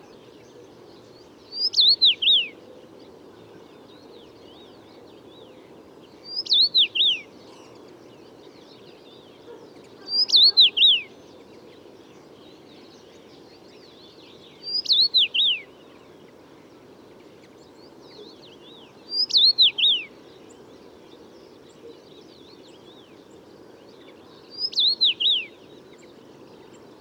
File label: Common Rosefinch Carpodacus erythrinus